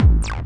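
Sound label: double hit bass drum; Kicks; single hits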